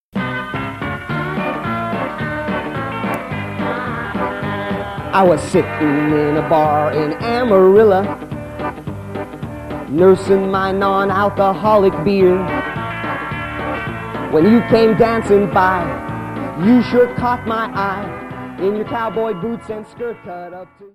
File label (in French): STEP, Etats-Unis